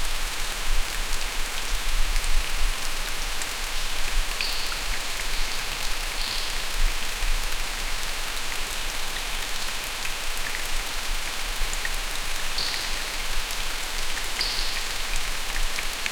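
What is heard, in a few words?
rain hitting large tropical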